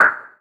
Percussive FX; Vengeance Samples; VEH1 Percussion

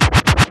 Vinyl FX and Scratches, FX Scratch

